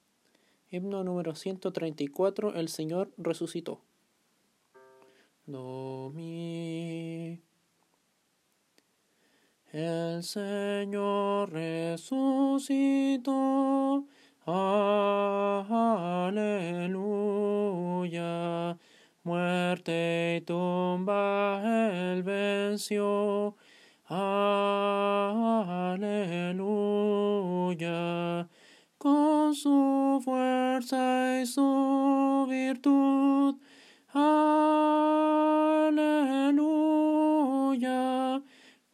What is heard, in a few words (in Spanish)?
TENOR VOZ, himnos en piano; cantos del camino